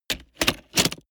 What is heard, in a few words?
plastic latch full